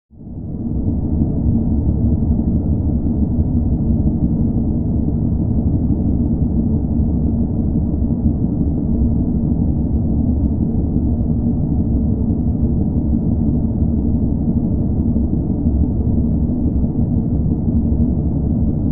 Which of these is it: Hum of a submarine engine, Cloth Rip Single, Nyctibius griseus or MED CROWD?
Hum of a submarine engine